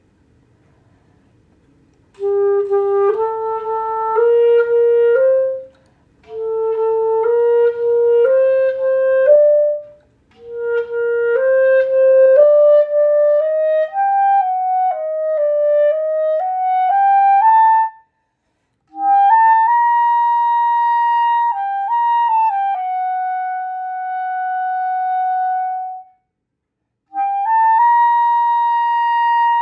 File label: Clarinet excerpt